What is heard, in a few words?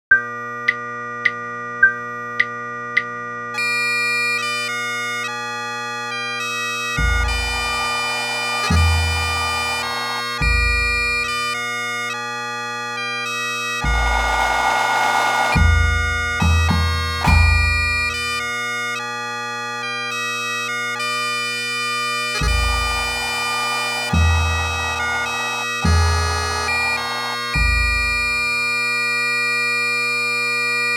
Steam Train Audio Full Score